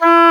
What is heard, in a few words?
WND D OBOE, Oboe D'amore; Roland L-CDX-03 Disk 1; Oboe 4-8